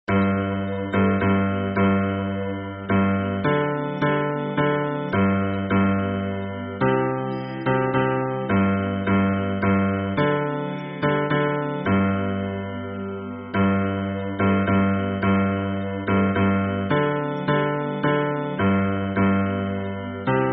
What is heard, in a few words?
hymn bass